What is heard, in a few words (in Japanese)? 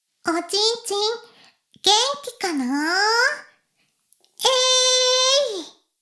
シコシコ音声; 古き城のドラゴニート